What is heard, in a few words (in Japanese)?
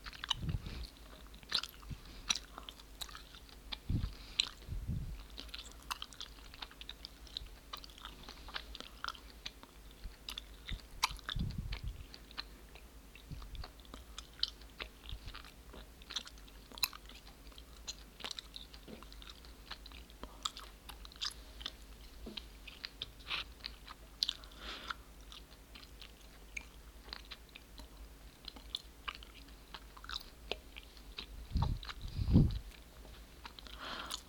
左耳用 女性のガム噛み ASMR